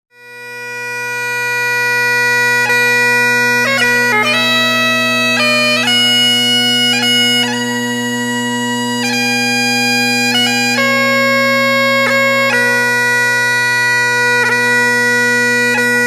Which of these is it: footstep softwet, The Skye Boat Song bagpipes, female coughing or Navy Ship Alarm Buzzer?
The Skye Boat Song bagpipes